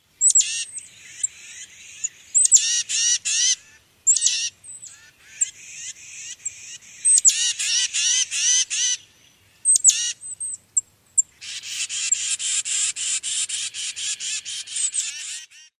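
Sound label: tiska-say-say and other calls, Tufted Titmouse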